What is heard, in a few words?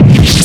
Hit Sword